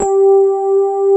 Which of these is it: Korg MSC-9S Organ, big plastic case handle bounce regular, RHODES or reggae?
RHODES